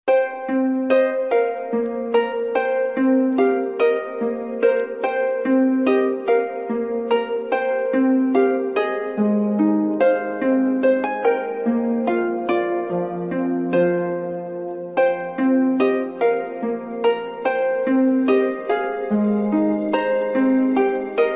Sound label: Simple Ringtone For Android; ringtones